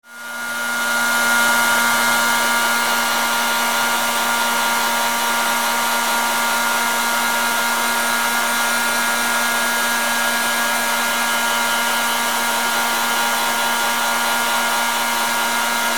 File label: hair dryer